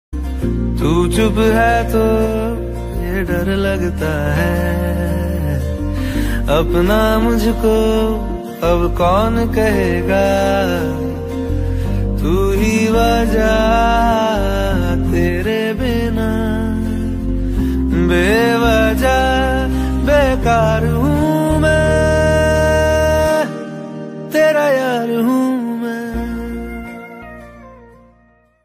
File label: Hindi Ringtone